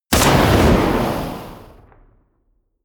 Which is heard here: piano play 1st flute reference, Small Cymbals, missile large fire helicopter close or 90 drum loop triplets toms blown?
missile large fire helicopter close